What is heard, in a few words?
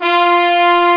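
fr horns F3, french horns; Star Trek JR Audio; F4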